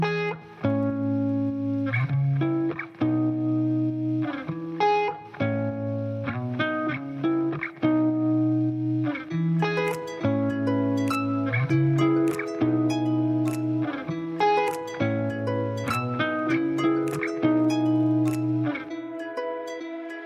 Old Bicycle Ringtone Huawei

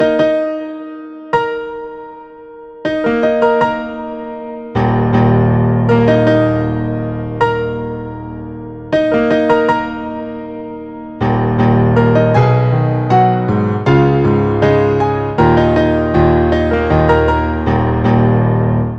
Redmi 8A Dual Xiaomi Ringtone

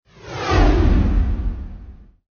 Official Notification; Samsung Galaxy Tab 7

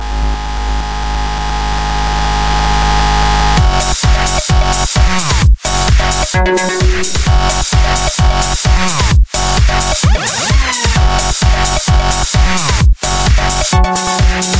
Electronic Music Ringtone

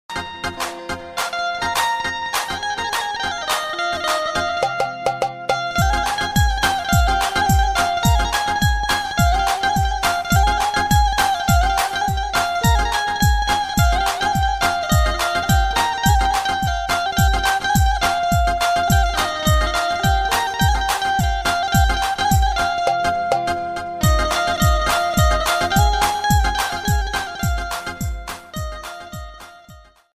Best Instrumental

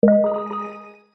Serene Notification Ringtone; OnePlus

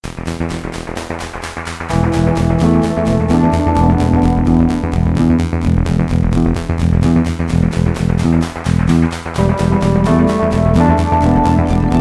Xiaomi Ringtone

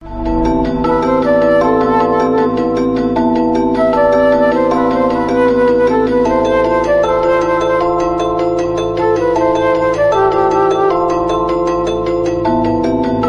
Tone; Google Pixel

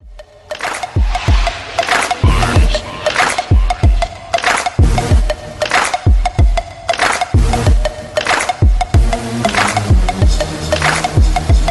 Beat Ringtone

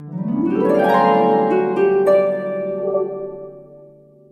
huawei tune living ringtone

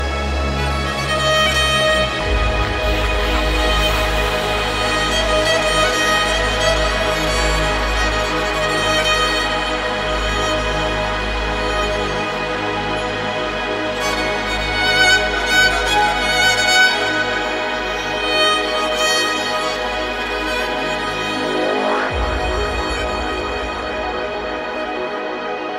iPhone Ringtone

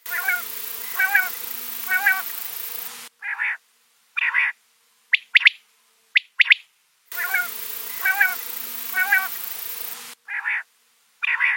koyal bird sound ringtone

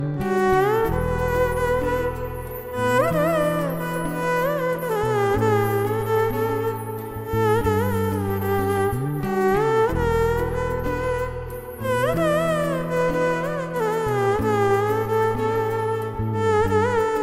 Malayalam Ringtone

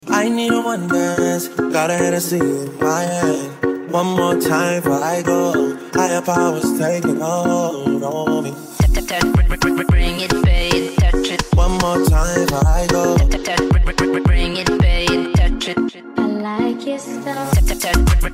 English Ringtone

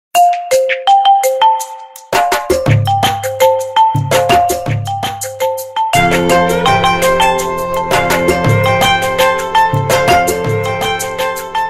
Huawei P60 Original Ringtone

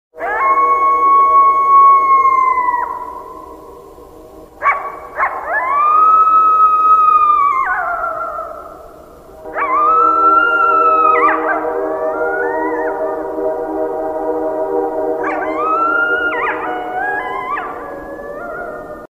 Coyote Howl Sound Ringtone

